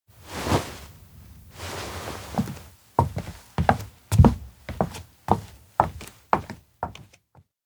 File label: bow exit stage; anemoi